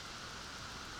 Background Noise

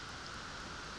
Background Noise

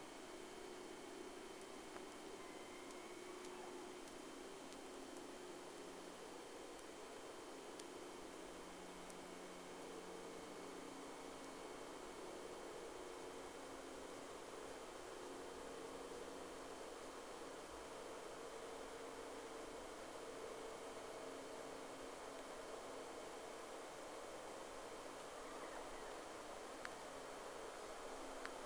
Whales; SeaSnake

